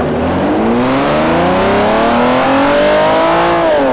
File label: first, vehicles m1